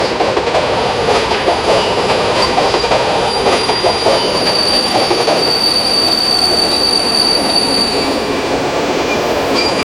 monorail train following

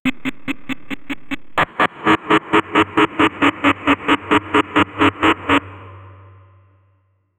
a glitchy menacing, bitcrushed

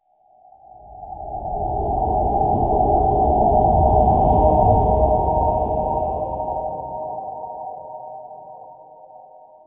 gentle whisper echo; ethereal

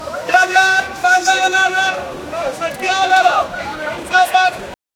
wide shot ancient rome marketplace

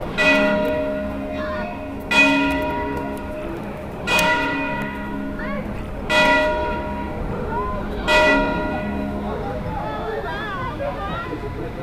medieval town where riots, conflict

